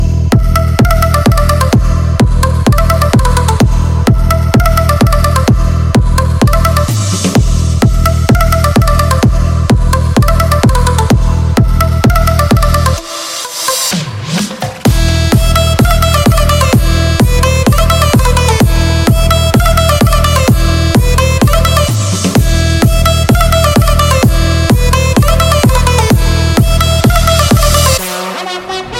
Infinix ringtones

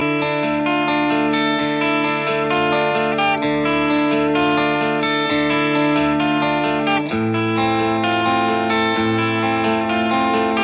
Infinix Light Ringtone

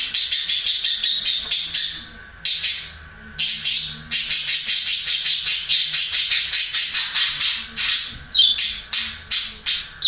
Budgie Sounds

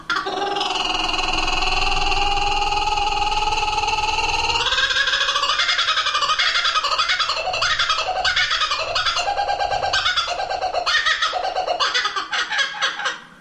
Kookaburra Sound

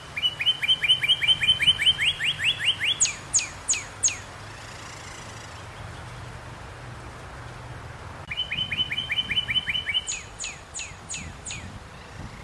Cardinal Sounds

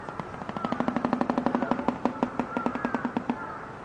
Shoebill Stork Sound

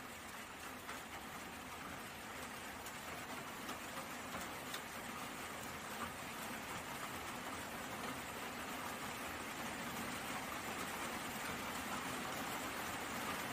Sleep Music Rain

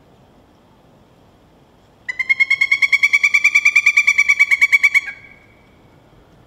Pileated Woodpecker Call

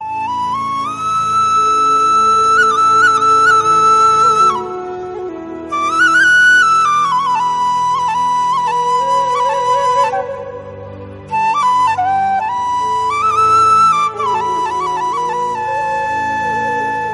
Beautiful Flute Ringtone

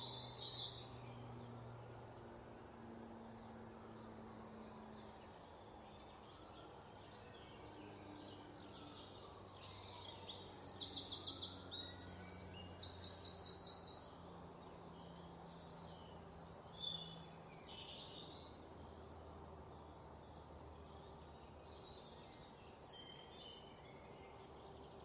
Rainforest Music

